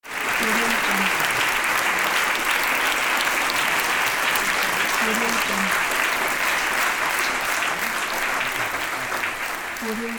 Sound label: applause